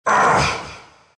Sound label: dragon pain; dota